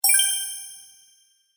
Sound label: Fairy Sparkle, magic; Magic Sound Effects